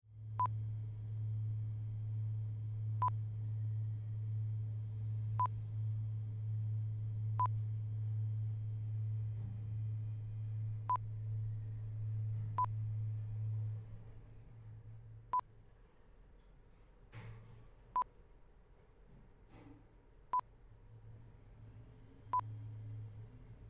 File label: Hotel Hallway Outside Lifts